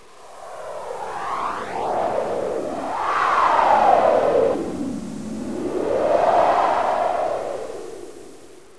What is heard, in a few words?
wind